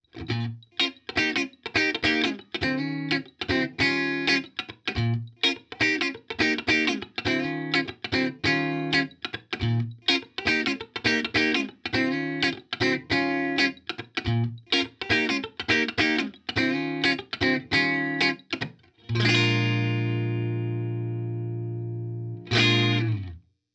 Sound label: tl clean center